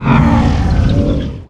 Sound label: truck pull over; talking tom gold run gamerip 2016; Spaceworld